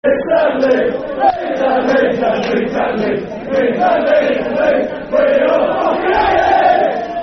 We All Hate Leeds, Football Chants (Soccer Song); Wolves; wolverhampton wanderers; fanchants

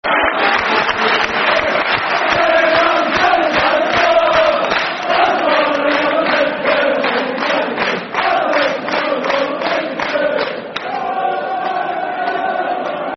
Bring Them On, Football Chants; Rangers GRFC

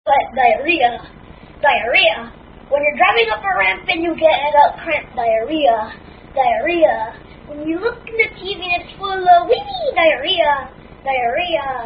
Rap; Aka The Diarrhea Song

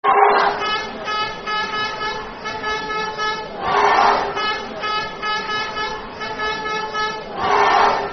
Wales, Clap, Football Chant, Soccer Song

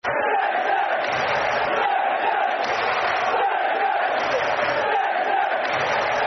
Football Chants, Man City; fanchants; Man City Mcfc

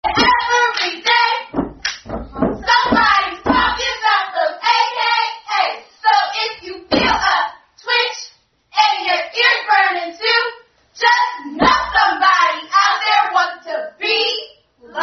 Alpha Kappa Alpha Chant; Someone Wants To Be Like You

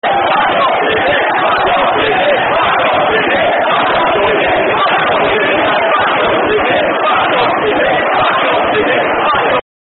Football (Soccer) Chant; Fuck Off Sydney

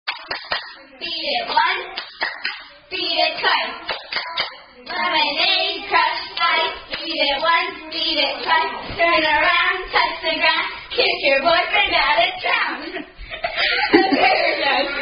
Clapping Routine Children's Hand Clapping; Children's Hand Clapping Rhyme; Lemonade Crunchy Ice

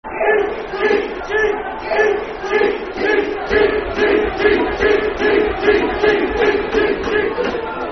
Cheat, Cheat, Cheat Football Chant (Soccer Song); Livingston Lfc

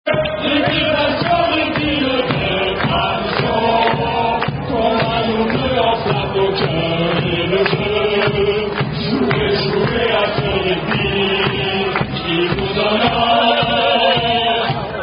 French Rugby Song; Dublin 6 Nations

